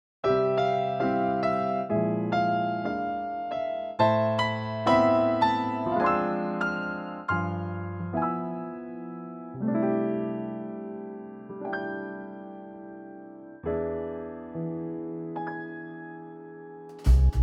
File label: trio; key - C to Db; Trio arrangement